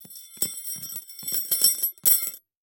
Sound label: Metal Stakes Dropping On Dirt; Sports Stake